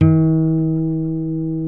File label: F 3 nylon, Guitars